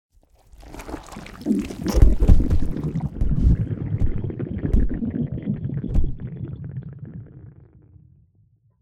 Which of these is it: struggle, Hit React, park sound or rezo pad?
struggle